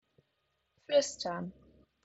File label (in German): flüstern